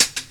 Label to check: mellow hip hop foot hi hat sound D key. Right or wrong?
Right